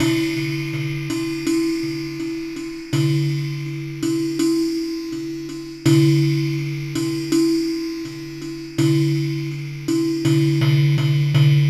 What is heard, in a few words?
belly loop